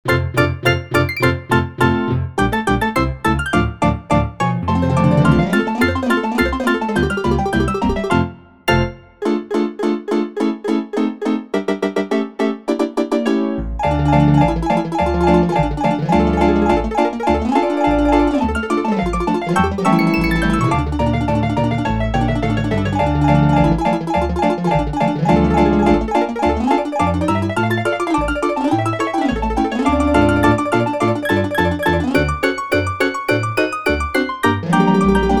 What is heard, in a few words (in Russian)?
GUSLI myagky zvuk; Raspin MIDI Rollscans